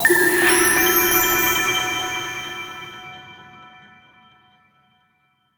SOUL JINGLE

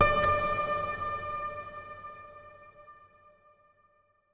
Chime; chime sharp

